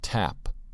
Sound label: tap